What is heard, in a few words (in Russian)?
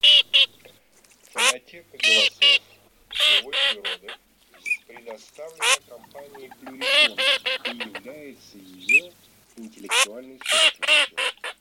Чирок-свистунок Alzavola